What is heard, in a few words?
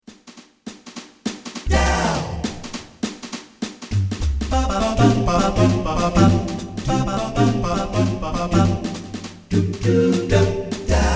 jazz scat